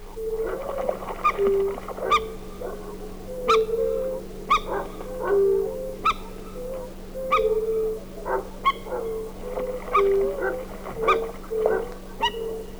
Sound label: gallinula chloropus